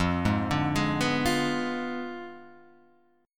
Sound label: F Diminished 7th F down Guitar Half Step Down